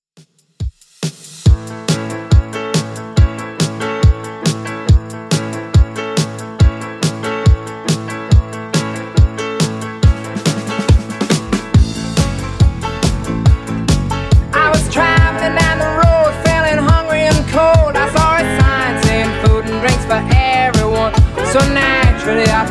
70s Redrum 140bpm

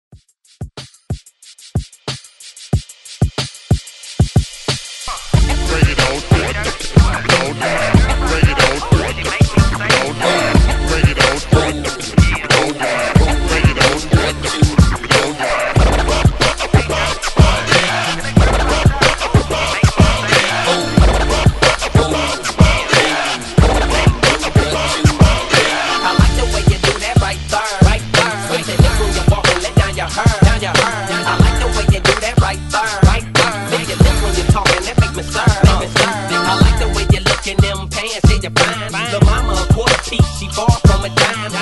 Scratch ReDrum 92Bpm; DJDOLLS

